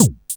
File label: NOIS FX